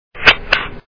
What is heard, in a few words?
SE Close; Shop Empire